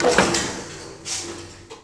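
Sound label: ambience interior hydraulic; Tony Hawk's Project 8; XBOX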